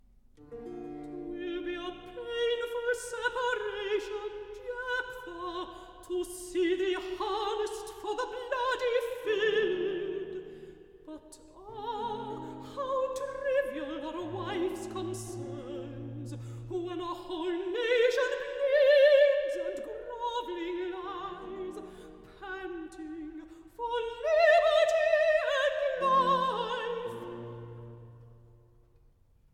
Recitative; Act I; Storge; Twill be a painful separation; Klassika; Handel Masterworks

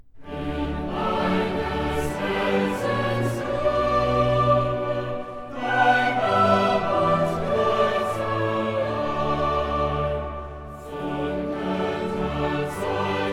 Choral; In meines Herzens Grunde; Klassika; Bach, Johann Sebastian; Choral Works; Johannes Passion BWV 245

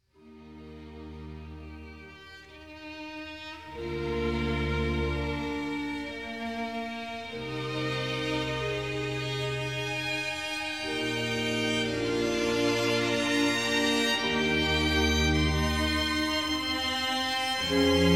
Organ Concerto in G minor - Adagio; Op.4 No.3; Handel Masterworks; Klassika

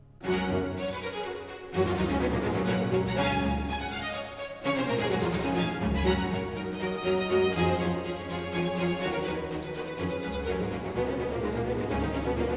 Chorus; Act I; When his loud voice in thunder spoke; Klassika; Handel Masterworks

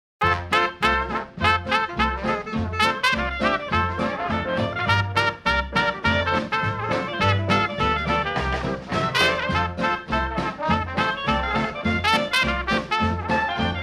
Jingle Bells, Orchestra; Ultra Lounge - Christmas Cocktails

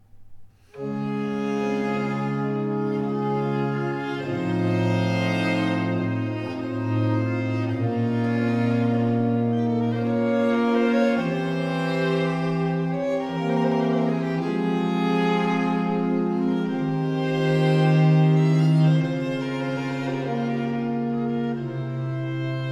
Sonate 11 in G minor - 3. Adagio; Arcangelo Corelli; Corelli - Complete Works; Klassika

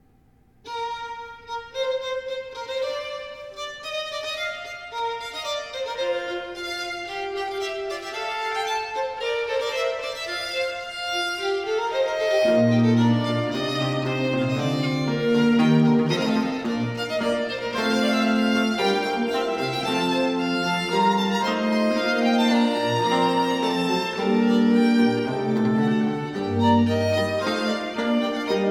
Sonate 8 in C major - 4. Allegro; Arcangelo Corelli; Corelli - Complete Works; Klassika

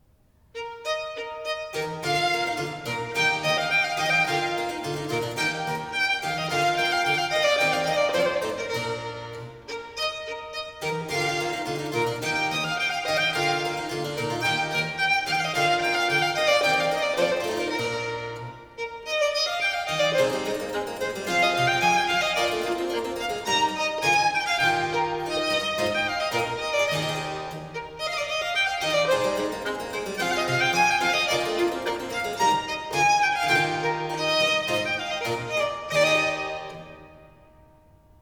Sonate 10 in F major 4. Gavotta, allegro; Arcangelo Corelli; Corelli - Complete Works; Klassika

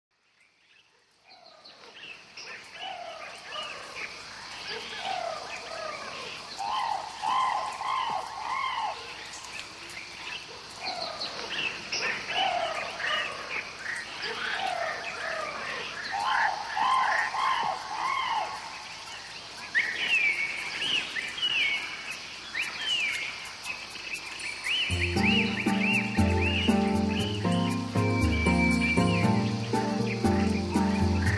Rain Forest; The Sounds Of Nature

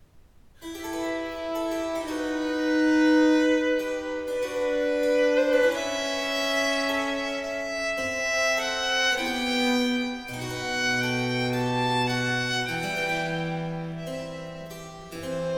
Sonate 9 in A major 3. Adagio; Arcangelo Corelli; Corelli - Complete Works; Klassika

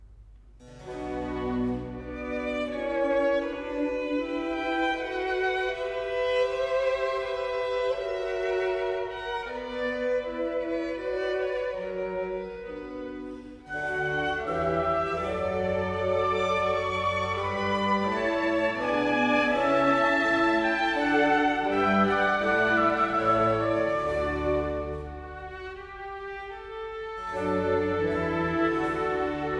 Concerto Grosso in D major, Largo; Handel Masterworks; Klassika

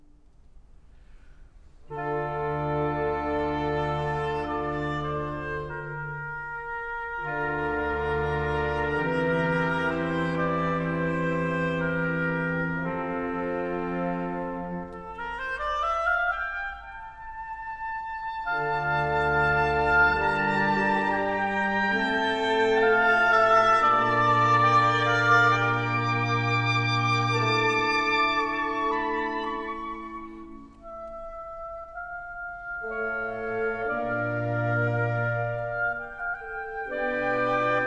Concerto Grosso in D minor; Handel Masterworks; Klassika

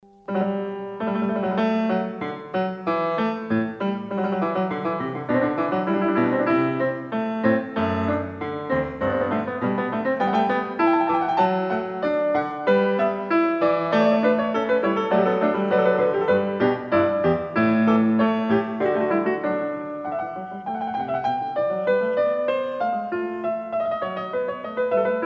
Bach Goldberg Variations

